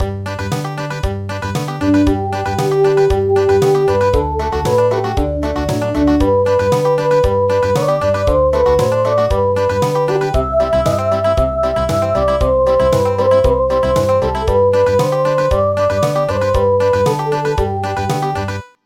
Country, midi

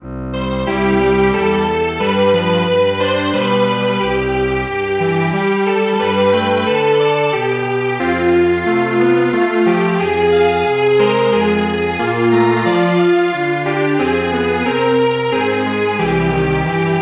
Strings Quartet, traditional; The Farmer

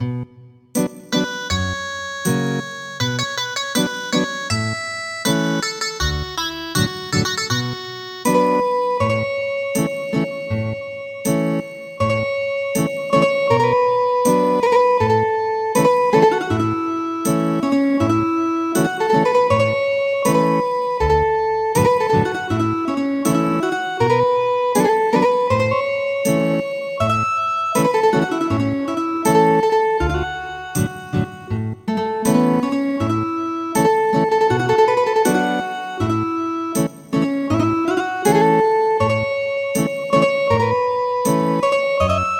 Ethnic Guitar; Autumn Mountain Song

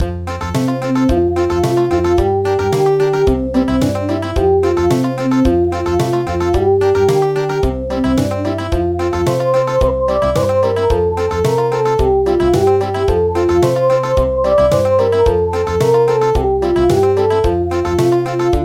Country; El Rotlleto

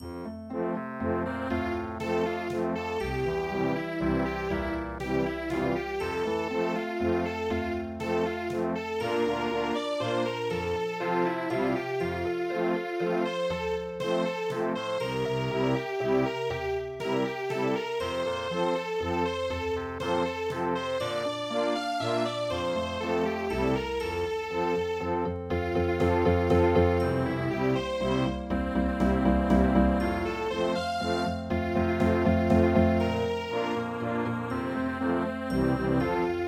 spring elegance vienna waltz

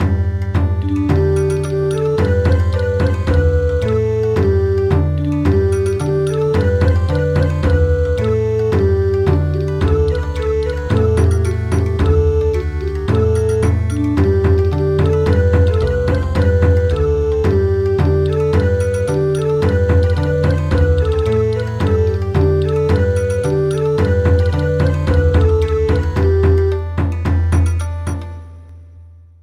Bon Odori; The dance of barley

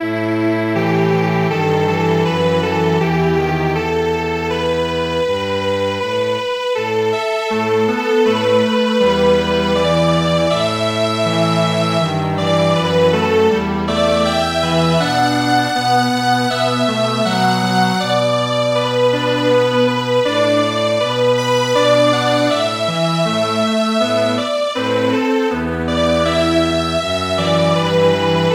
Strings Quartet; Choshi Tairyou Bushi

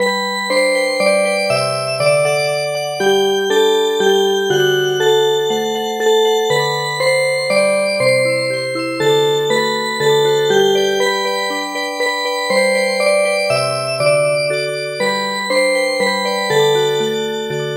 Music Box; We love the heroes

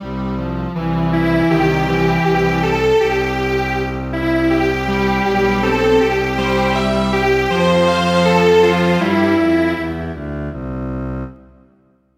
Strings Quartet

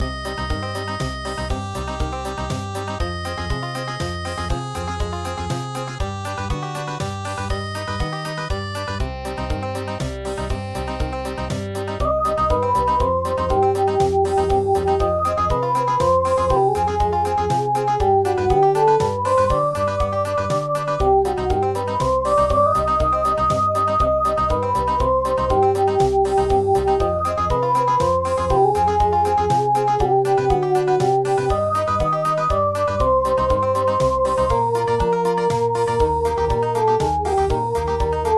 Country

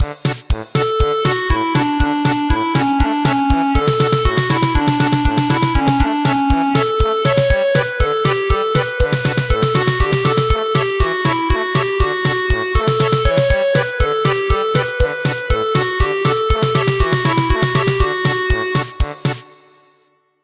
German Polka, midi traditional; Your handkerchief

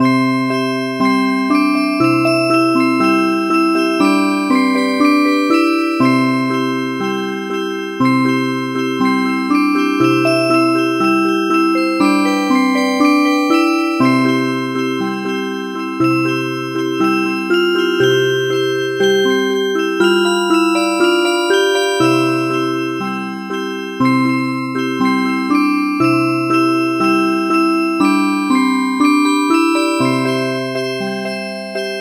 Music Box

